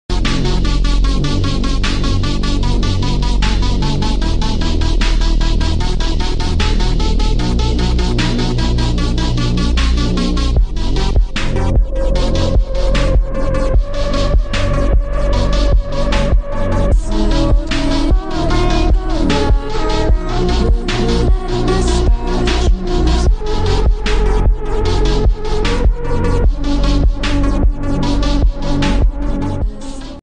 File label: slowed remix